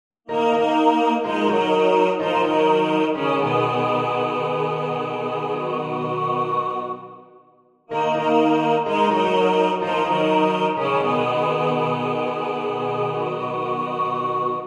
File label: Coldest Winter Synth Voices